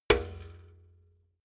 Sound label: metal bucket hit